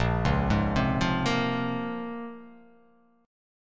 A sharp Minor 9th A sharp; arpeggiated; 6,4,6,5,5,5,x,x

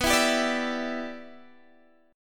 E Minor 7th B

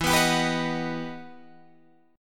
E Minor Major 9th E down Guitar Drop D

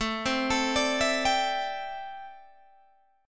A Minor 11th A Guitar Open G

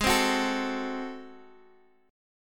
A flat 7th Flat 9th A flat, chord; Ab7b9; x,x,x,x,8,7,8,6; strummed; chordshape; JGuitar

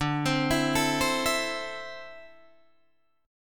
D 7th Suspended 2nd down Guitar DADGAD; D7sus2; arpeggiated

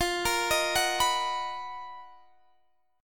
B Major 7th F, chord; arpeggiated

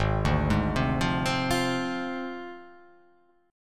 Ab 6th Flat 5th, chord; arpeggiated; JGuitar

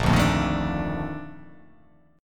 A sharp Major 11th, chord

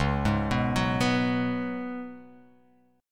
C sharp 7th Suspended 4th C sharp

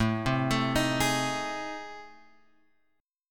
A Minor Major 7th Flat 5th; AmM7b5 Chord {5 3 X 2 4 4} arpeggiated

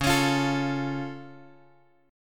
C sharp Augmented, guitar chord; C#+ Chord {X 4 X 6 6 5} strummed

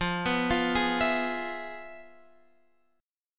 F Minor Major 7th Flat 5th Guitar Open F; FmM7b5 arpeggiated; JGuitar

